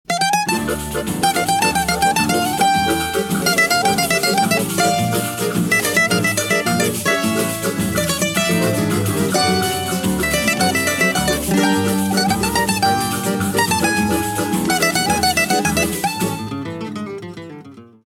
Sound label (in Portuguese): bandolim; instrumentos